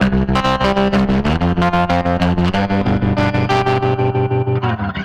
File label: Guitars A, 95bpm, analogue circuit; musicradar